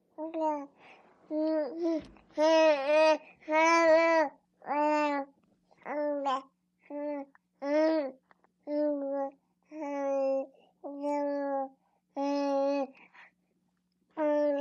4 Month Old Baby Talk, BABY FOUR MONTH OLD GIRL: INT: Talk